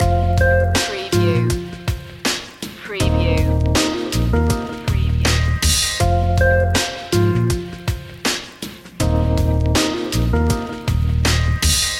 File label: wavebreak loop